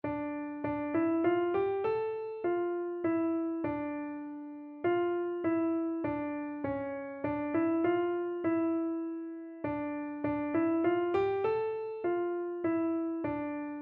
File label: aberystwyth, keyboard